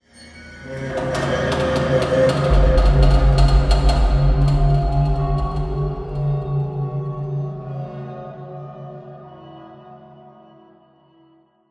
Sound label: building metal; strider; Half Life Alyx